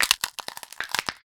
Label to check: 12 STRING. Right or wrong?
Wrong